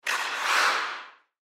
Hockey Player Move, Hockey Player Stop Skate Blade Scrape Ice; SPORTS; Hockey (Sound Effects:Sports); Blastwave